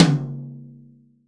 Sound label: TOM, COATED TOM